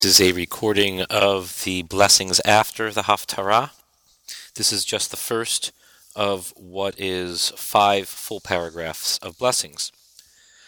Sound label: haftarah blessings after reading; Prayers Online